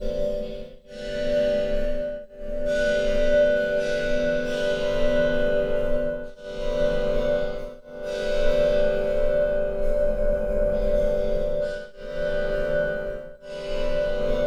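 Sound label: ambient drone; Zero G; Malice In Wonderland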